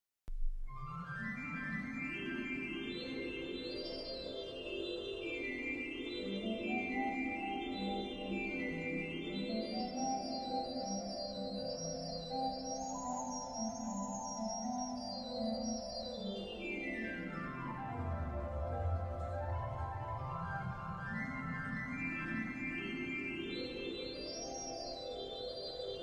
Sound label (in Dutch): orgel in de Sint Nicolaas of Bovenkerk; Hinsz